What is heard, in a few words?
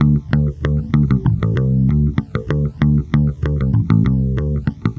WAH; USB Soundscan vol.31; Miro s Grooves; AKAI